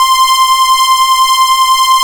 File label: C6, MOOG2 LEADS